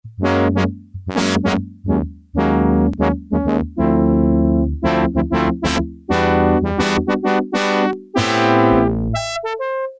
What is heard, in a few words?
Full Brass, Yamaha TX81Z